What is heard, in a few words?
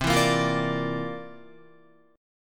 C 11th C down Guitar; C11 strummed